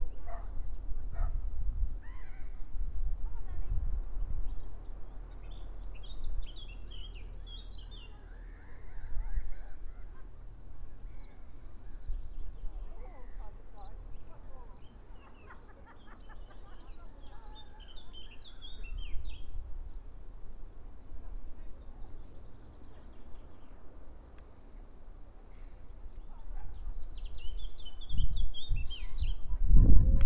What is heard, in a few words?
Sylvia atricapilla; SETE